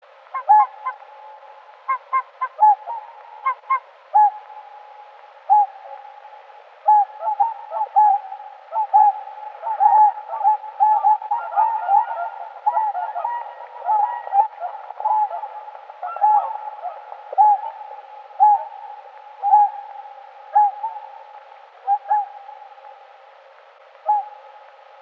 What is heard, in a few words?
Cygnus cygnus